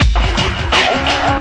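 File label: 085 bpm heavy female vox loop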